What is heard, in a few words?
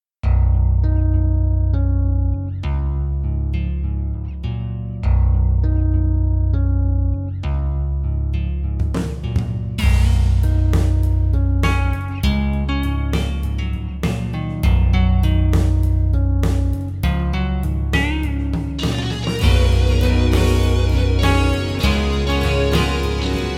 acoustic bass guitar; Manytone Acoustic Electric Bass; manytone ovacoustic bass guitar